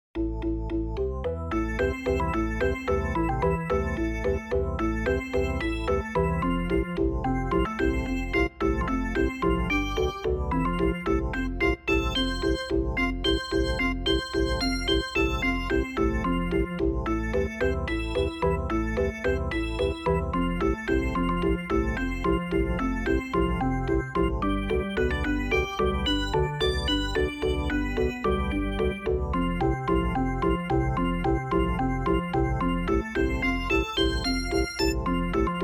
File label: Perc Walzer; maestro vol. 2